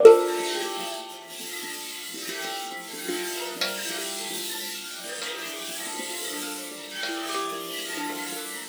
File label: GLISS; Xsample VOL-4